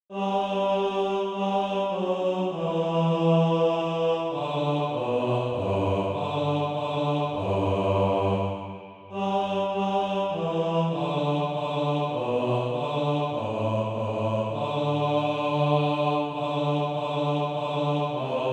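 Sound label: bass, Practise Music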